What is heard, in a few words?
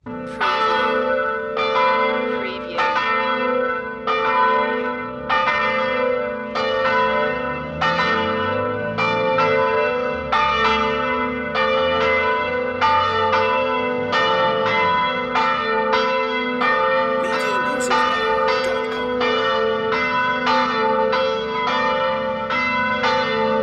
Church Bells Scaling Distant